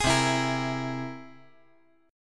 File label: C Augmented 7th C down 5G, 4C, 5E, 5A